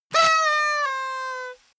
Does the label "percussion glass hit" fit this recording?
No